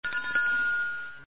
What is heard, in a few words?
bell